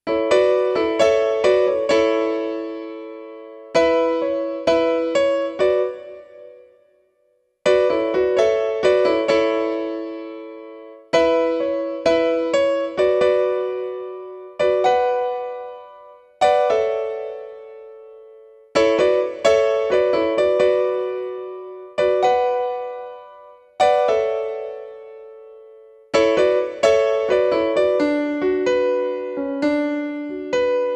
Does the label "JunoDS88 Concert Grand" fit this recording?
Yes